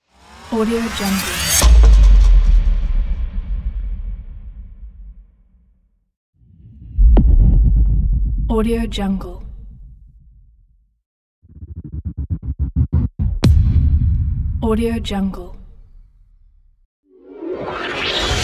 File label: trailer powerful boom fx